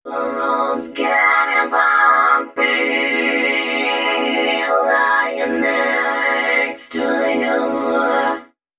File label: female c#, Dance Ultra Voice FX; Mutekki Media